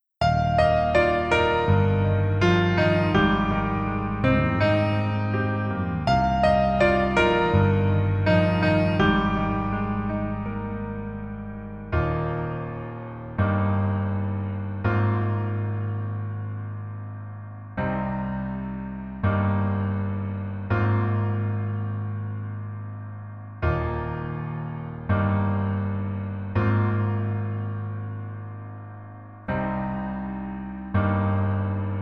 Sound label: Piano ver